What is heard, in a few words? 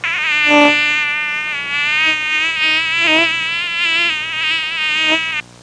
Mosquito sound close